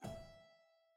Harpsi Low Rel Far E4, Harpsichord, Flemish; Chordophones; Releases

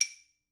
claves mf; Idiophones; Struck Idiophones

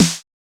snare, 808 mini, drum machines

